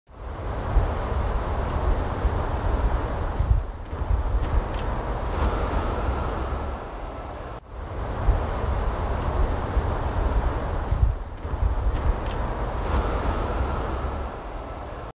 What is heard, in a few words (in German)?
Vogelstimmen